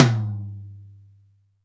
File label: TAMA 12 TOM; AKAI S-Series CD-ROM Sound Library VOL-1; DRYKIT1